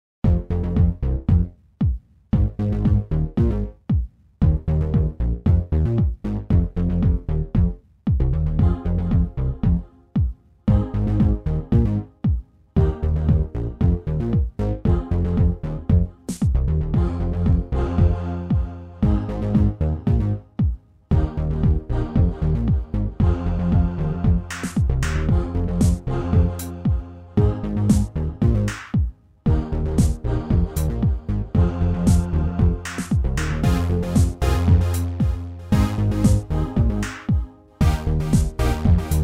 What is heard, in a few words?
back rain